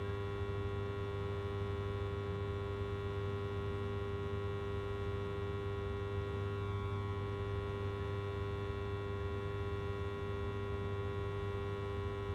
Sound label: lamp working; Factorio